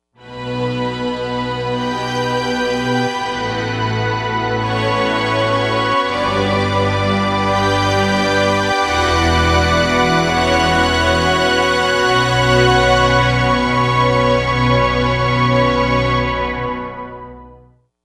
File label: Lush Octave; Digital Synthesizer